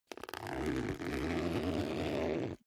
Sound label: zipper backpack open slow